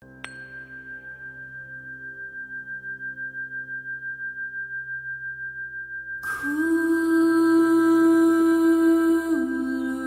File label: Healing Chant